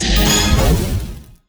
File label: expand wild; Fire Queen